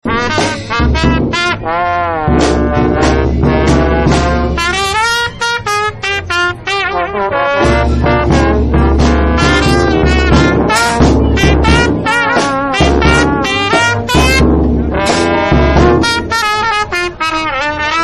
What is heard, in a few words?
Jazz Trio dixie